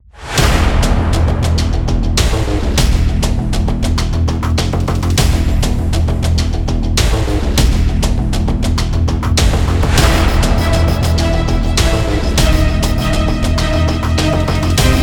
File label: Epic Battle; Dual Blade: Battle of the Female Ninja